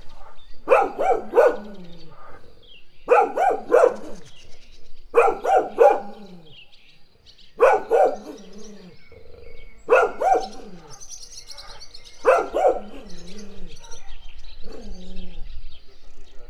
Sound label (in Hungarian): farkcsóválva fecskék, kutya; Egerszög; termeszetben